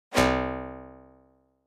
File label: Elect Spring, multimedia element